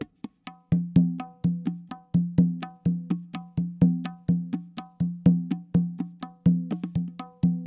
PERC LOOP ETHNO 125; eJay

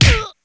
Minimize; Atlantis

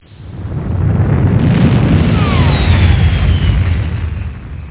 flyby; Duke Nukem 3D Kill-A-Ton Collection

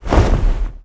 eagle wing

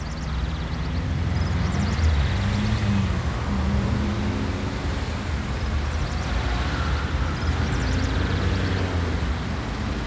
town sound; sverigejakten

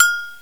Agogo H, Drums; TG300B; GMPlay

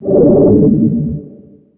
sound force healing; MOTS

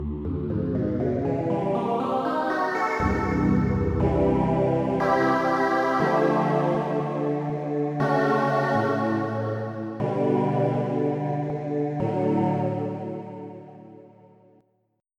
Echo Drops; FF7

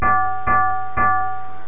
chime pit; autodesk animator

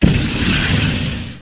float dsk; TNP demo

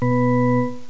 PIPE

